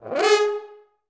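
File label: french horn G#3; Best Service; Peter Siedlaczek's Advanced Orchestra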